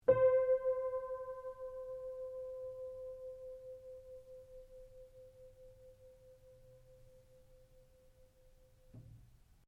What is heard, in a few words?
PIANO UNTUNED C4 MF, Baby grand piano left to fall out of tune; Pianos; Musical